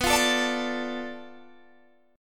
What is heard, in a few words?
B Minor 7th Flat 5th B, Guitar Open G